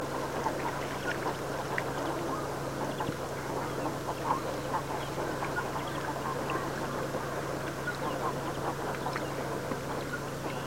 Sjoorre

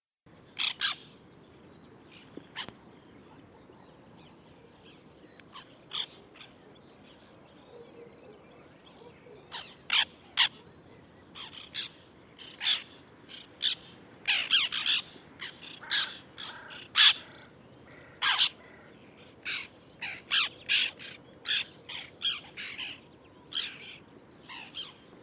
Vitvingad tarna

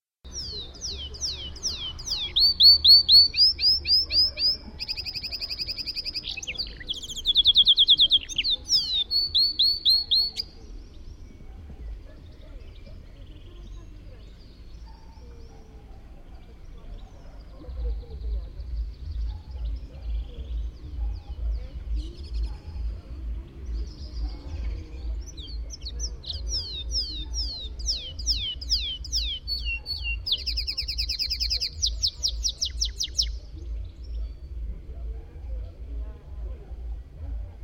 Kanariesiska

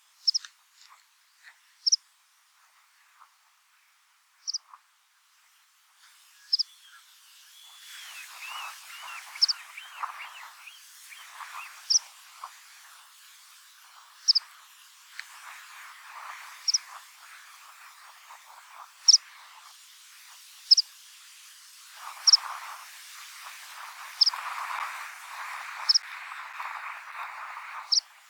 Rodstrupig piplarka

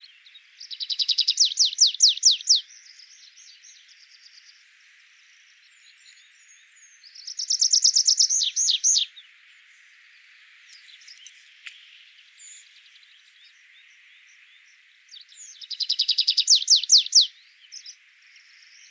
Tradpiplarka

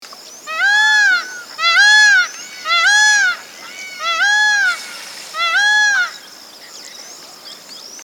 pafagel

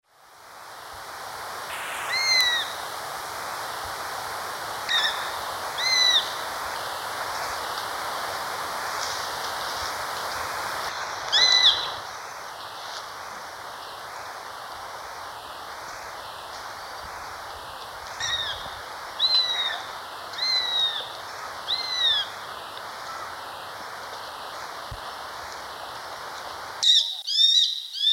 Sparvhok

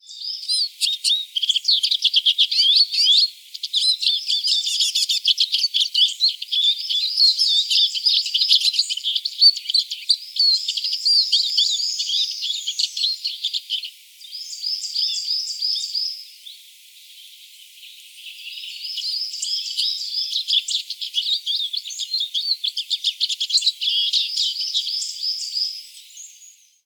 Gronsiska

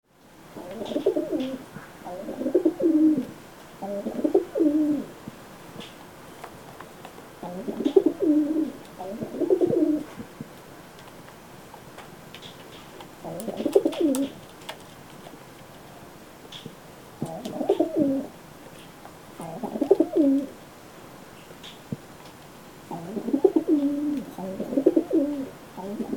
Tamduva

